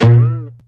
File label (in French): rebond; Quentin C's Gameroom